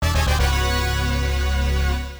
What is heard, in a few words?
Fanfare II (Rank Down BGM); Lethal Enforcers; arcade